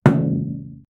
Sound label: one heavy metal ball impa